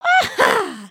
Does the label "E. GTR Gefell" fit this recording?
No